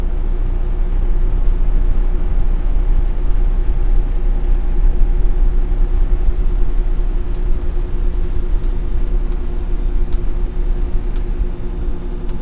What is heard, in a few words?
interior office; ambient; Counter Strike Model Pack; CS:GO; nuke